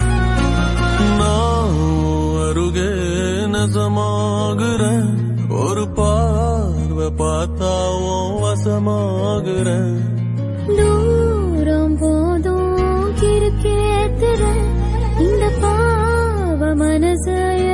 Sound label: Tamil Song Ringtone